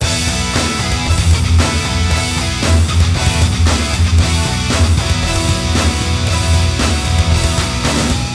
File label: rocking